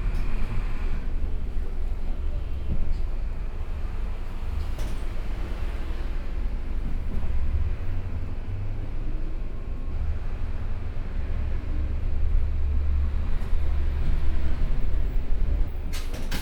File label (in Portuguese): passe de onibus, Brasilien; Fieldrecordings